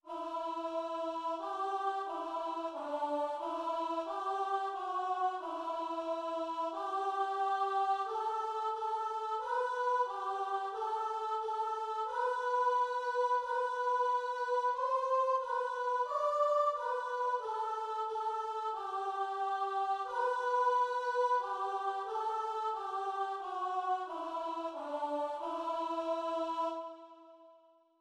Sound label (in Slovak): sopran; zborove skladby